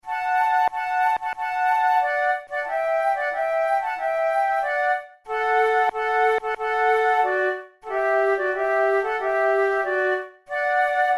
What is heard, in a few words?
duet, flute tunes; Paisiello; Whither my love